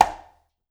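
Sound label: conga slap left; Spectrasonics; Liquid Grooves 1 & 2; CONGAS+BGOS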